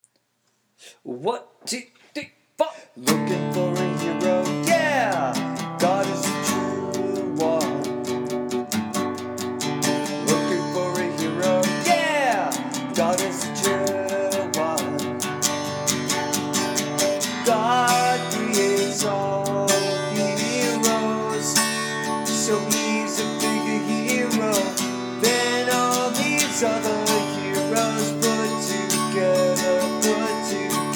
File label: with vocals; english